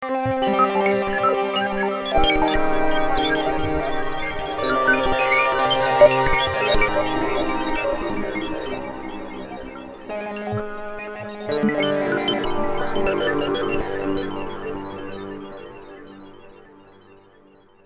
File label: formantik, synthesizers